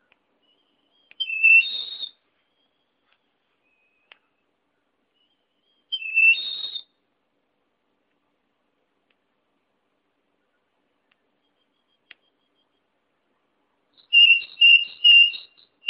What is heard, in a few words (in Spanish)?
canto de mirla